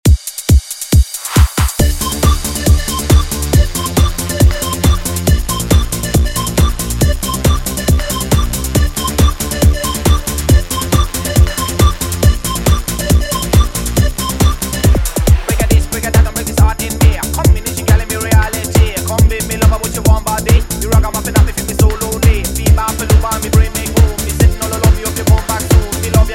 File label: 138 bpm